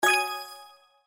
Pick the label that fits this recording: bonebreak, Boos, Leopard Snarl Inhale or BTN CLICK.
BTN CLICK